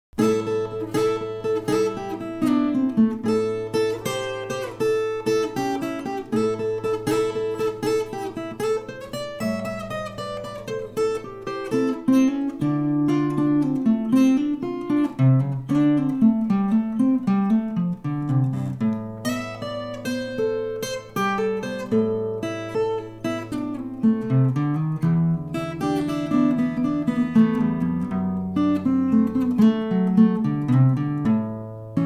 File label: dark day blues